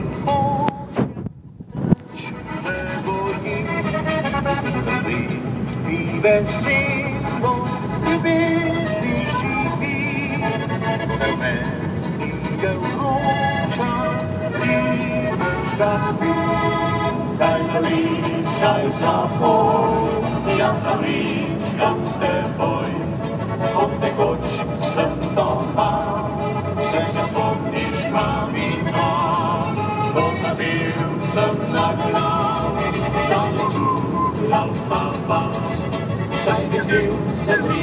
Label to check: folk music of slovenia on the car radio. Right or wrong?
Right